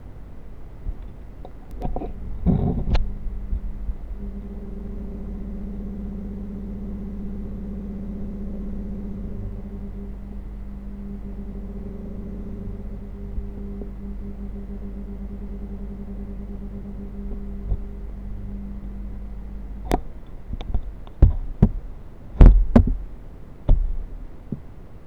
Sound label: motor after